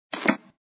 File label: Slurp; Feed Fribbit Colors